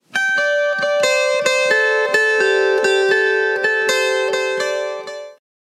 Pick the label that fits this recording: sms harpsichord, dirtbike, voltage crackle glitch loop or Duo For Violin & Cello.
sms harpsichord